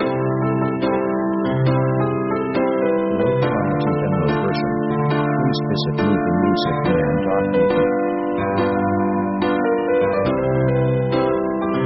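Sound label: orchestral chanson sketch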